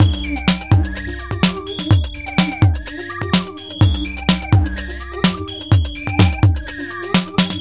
Elektro, Processed Percussion

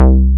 Synth Bass, Roland

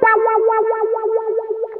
GUITAR

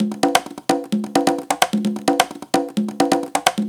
Live Percussion, 130 BPM

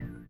VIBRA; Spectrasonics; Metamorphosis

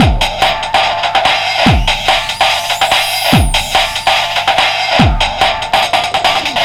144.3 bpm; 90 s Sample CDs; Planet of the Breaks

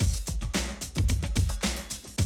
drum loop, 100 BPM

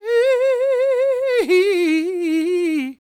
CROON, Vocal Planet Blues & Soul; CW SOUL

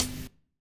Dance Drums

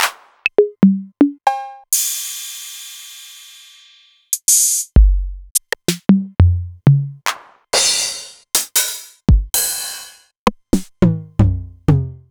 Novation Drumstation; DrumstationV1

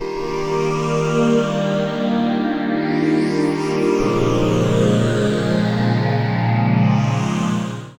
Virus String; Strings & Pads; Sonic Foundry (Sony Creative Software); The Electronic Music Manuscript Collection

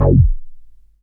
F#1, KORG DW8000, Vintage Keyboards; Big Fish Audio - First Call Vintage Keyboards

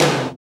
TOM TOM, Real

